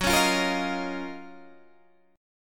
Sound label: F sharp Major 13th Guitar DADGAD